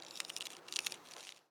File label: Rustle Leaf Blow Across Ground; SFX Kit; Foley - Rustle